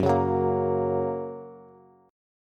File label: F sharp Major, Bass; strummed; JGuitar